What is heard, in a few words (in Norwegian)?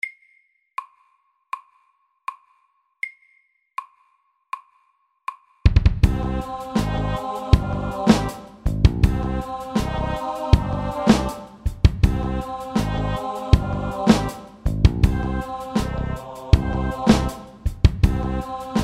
Octave rap FORT